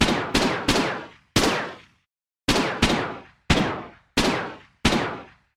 Gun Call; Brad Has a Pain

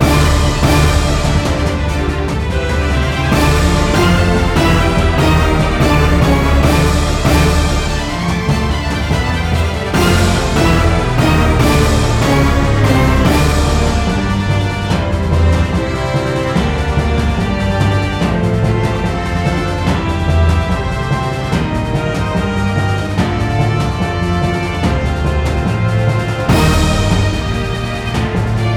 BGM; Heroland

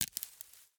foliage break; teardown ps5 windows xbox series xs gamerip 2022

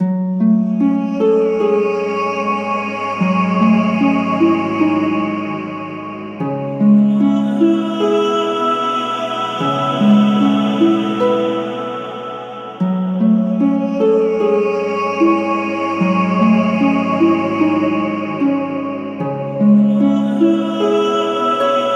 bgm 75 bpm; legrand legacy tale of the fatebounds; Murias

